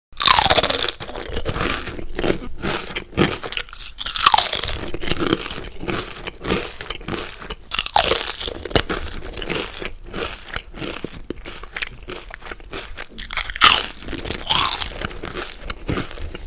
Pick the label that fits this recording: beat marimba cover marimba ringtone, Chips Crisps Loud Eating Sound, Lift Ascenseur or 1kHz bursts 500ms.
Chips Crisps Loud Eating Sound